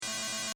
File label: Scifi SFX Loop, Short; Stuart Duffield